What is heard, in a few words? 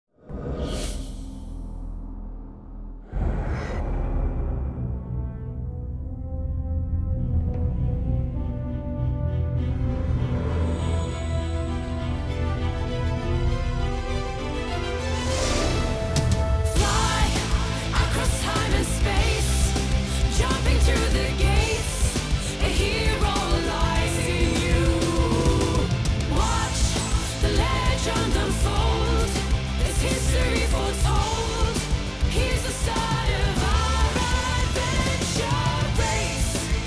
Opening - English; Wakfu S1